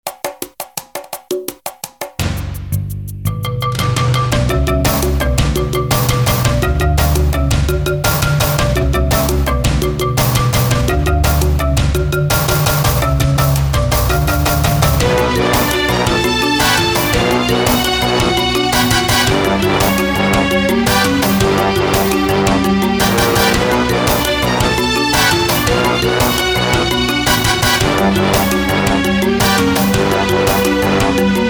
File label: Jungle Midi